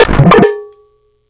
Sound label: milk bell